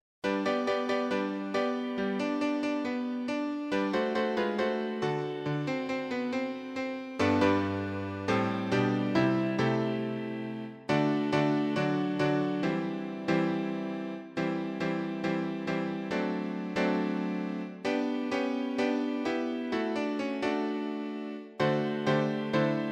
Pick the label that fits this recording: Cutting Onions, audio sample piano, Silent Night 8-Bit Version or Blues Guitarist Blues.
audio sample piano